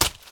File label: mud step; Golden Crayon Sounds